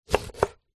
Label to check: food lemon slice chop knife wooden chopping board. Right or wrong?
Right